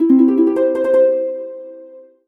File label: MUSIC EFFECT Solo Harp Positive; startide macos switch windows gamerip 2017